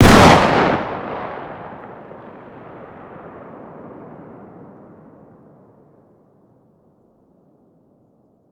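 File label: fire distant 12ga exterior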